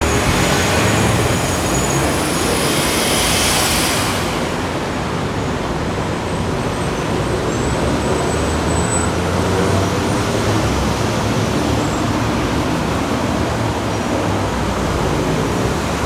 city busy LA, street traffic; best service Blue Box

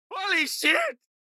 town human road; Blind Drive

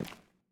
Footsteps; Hero; Blind Survivor

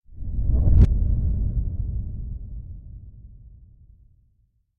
Impact Deep Reversed Air Hit

